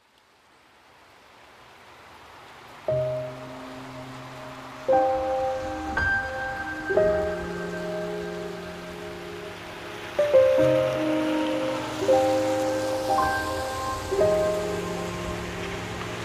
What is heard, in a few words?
Piano and Rain